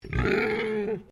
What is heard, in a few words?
Animal Pig Landrace Inside Grunt